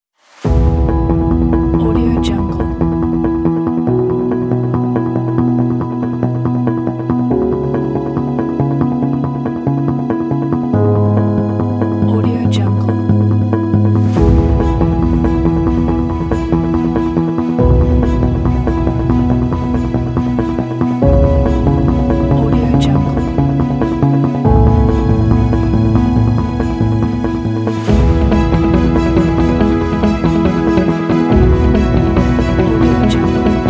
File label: rising inspiration